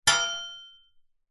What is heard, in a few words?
impacts metal hollow frame hit sharp strike chime